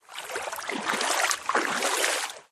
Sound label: water wade